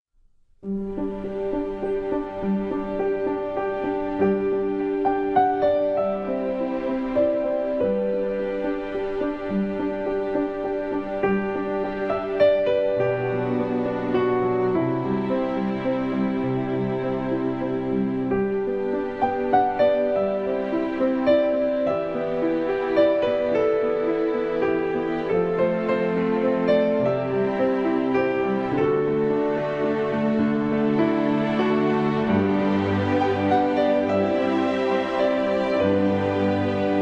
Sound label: Forest Relaxation